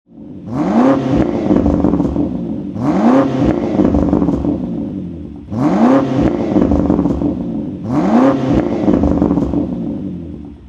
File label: Range Rover Sport